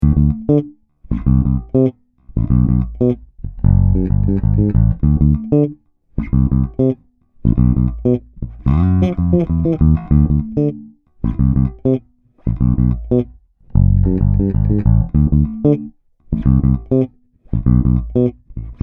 Music Man Caprice Finger

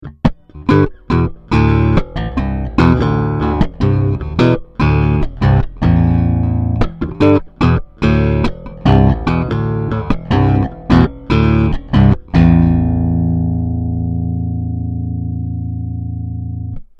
finger parallel

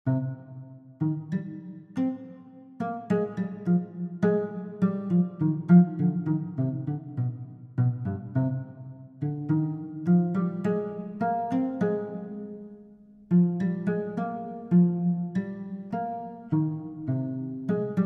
Viola da Gamba

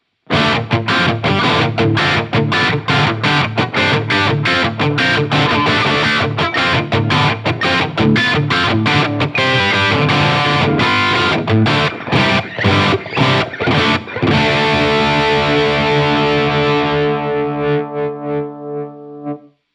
LTD Arrow Black Metal Crunch

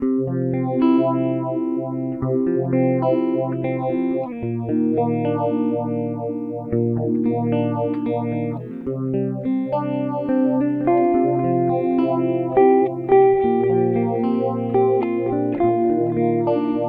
Mellow

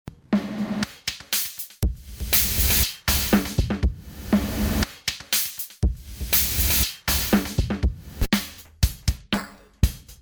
Drumloop Synchronus; Reason 10

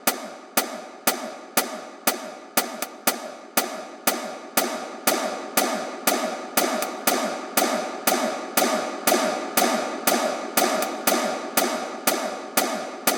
XLN Audio Life Reverb

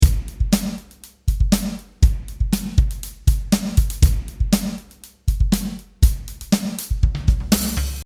Beat Gate

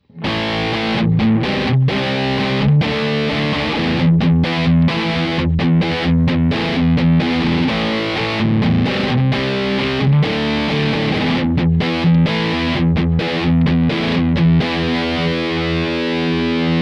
Blackstar St. James Tonestack Model Marshall; Liquid Profiling

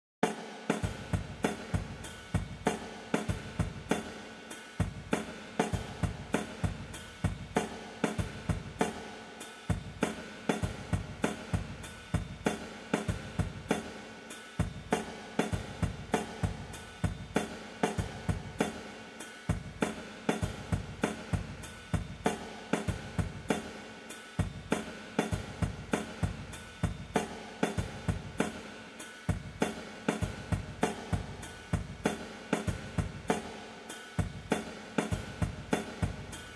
Eventide SP2016 70ties Kit SP2016 Room Modern

